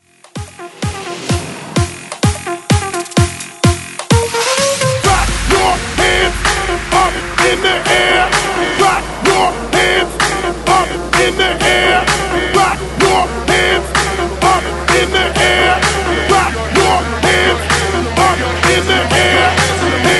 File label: Hype 128 Bpm; DJDOLLS